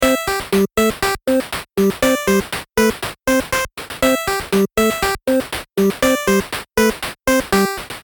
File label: Short 8Bit